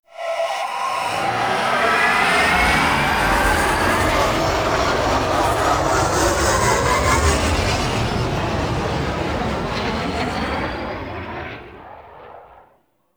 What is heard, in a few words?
Level Undocking, Vehicles; Warframe; Liset; Zariman